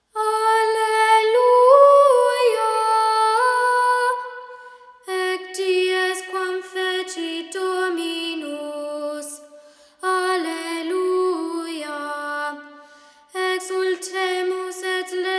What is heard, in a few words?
alleluia haec dies